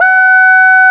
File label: QUAC, QUACK SOLO, Synth; InVision Interactive; Keith Emerson; The Most Dangerous Synth and Organ